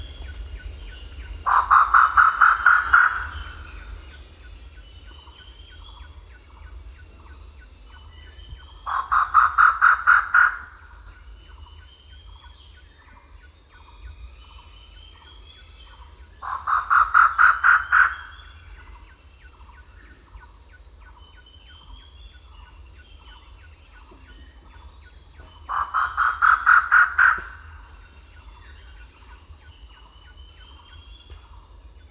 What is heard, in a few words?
Grey Peacock Pheasant Polyplectron bicalcaratum, grey peacock pheasant call